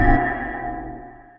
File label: ambient; Sonic Foundry (Sony Creative Software); Ugly Remnants VOL-2; Beatstuff